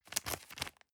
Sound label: plastic bag small turn soft